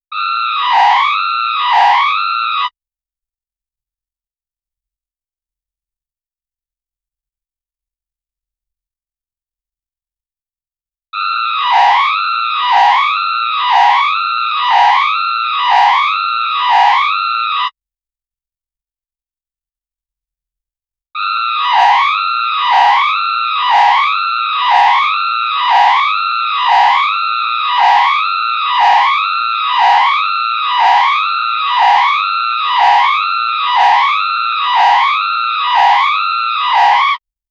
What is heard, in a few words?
emergency alert system al, discordant